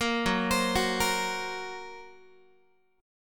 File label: Gb Major Flat 5th, down, Guitar